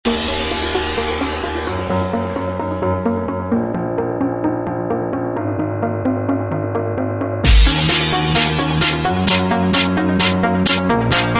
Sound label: Club EDM Anthems